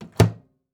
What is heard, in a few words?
Household Microwave Door Close